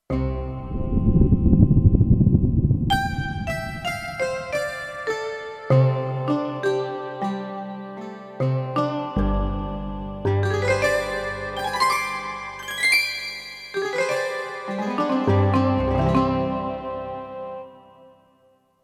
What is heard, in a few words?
All Alone, Kurzweil K2500X